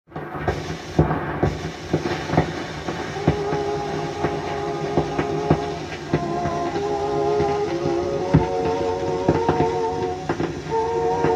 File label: Ladies Brass Quartet play Morning Serenade; The Fadettes of Boston